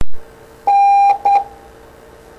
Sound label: Morse N